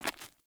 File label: Walk Stone, Footsteps; Player; Mother